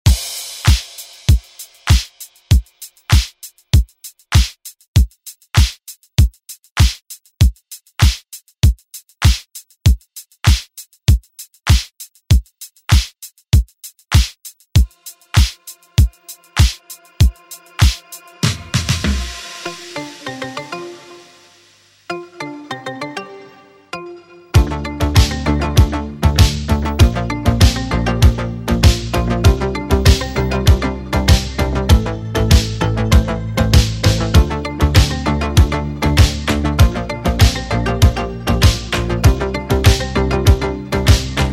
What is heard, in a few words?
ReDrum; remixplanet